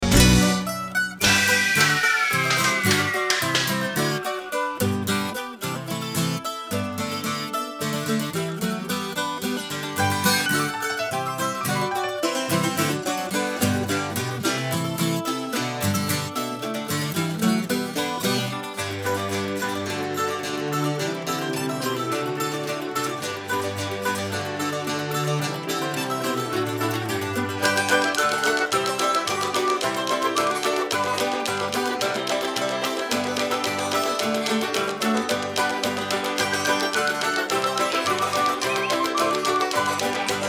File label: Mandolin